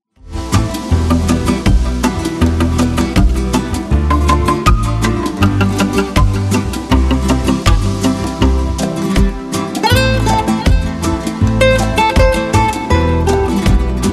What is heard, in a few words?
guitar island, island reggae